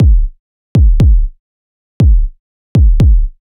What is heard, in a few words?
drum kick, Kick convolution